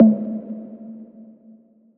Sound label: tom blimp